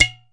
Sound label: ROLAND SPD-20 PERC TABLA; ETHNIC and WORLD PERCUSSION